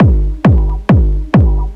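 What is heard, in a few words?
drum loop 135; House; Best Service ProSamples vol.10 - House; AKAI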